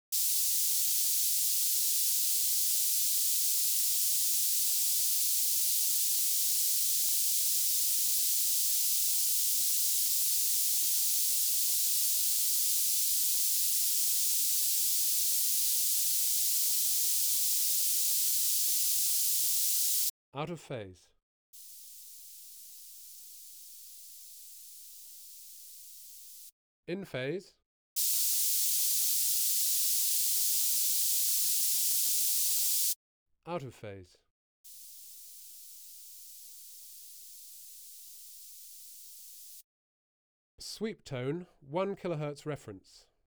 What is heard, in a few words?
Pink noise bands and phase check, HF 7 kHz